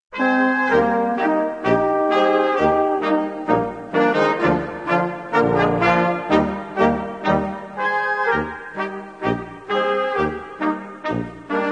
Mattersburger Schottisch, Autriche

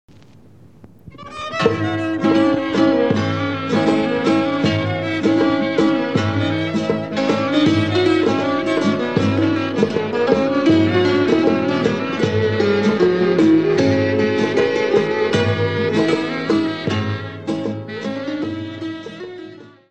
Hiotiko, Grece

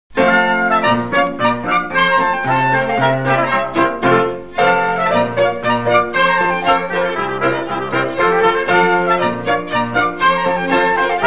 Maclotte liegeoise, Belgique

